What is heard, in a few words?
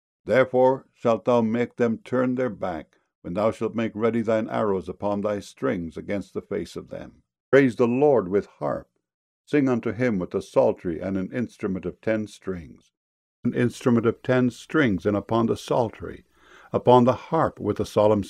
strings